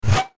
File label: bullet flyby